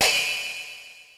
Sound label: HAT, Hip Hop; HipHop Kick Snr Hat; PlatinumPhattCD1; E-MU Producer Series Vol. 8 – Platinum Phatt